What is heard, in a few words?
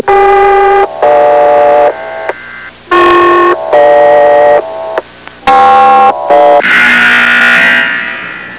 claxon